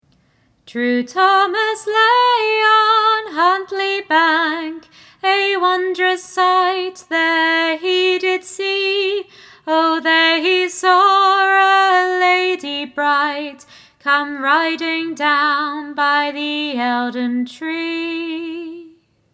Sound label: Thomas the Rhymer vocal harmony